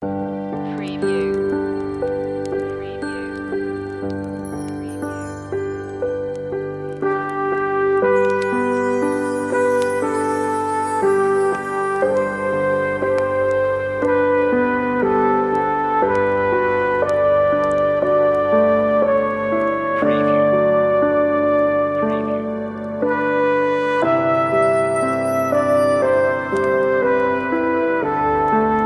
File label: While Shepherds Watched Relaxing Christmas, on-hold music; MediaMusicNow